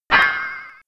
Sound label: clang; zelda; weapons